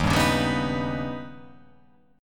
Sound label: D sharp Augmented 7th D sharp; x,6,5,6,7,6,5,8